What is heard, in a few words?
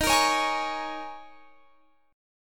D sharp 7th Suspended 4th